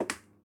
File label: ceramic step; Golden Crayon Sounds